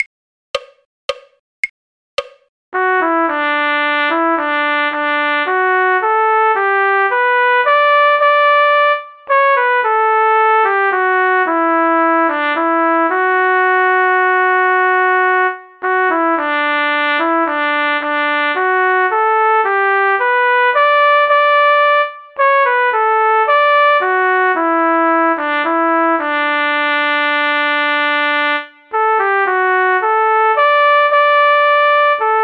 trumpet; folk

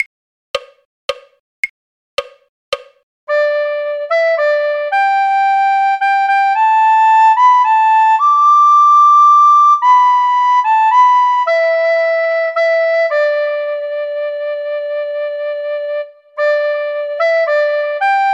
recorder; skye boat song; folk

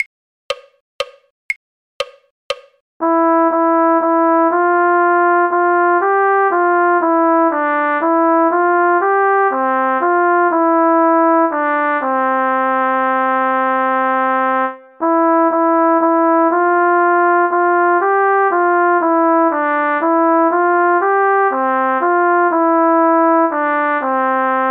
drink to me only with thine eyes cornet; folk

